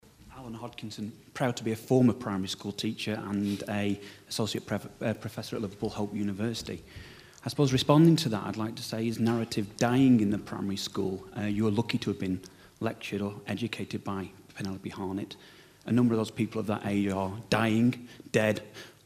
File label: Audience Response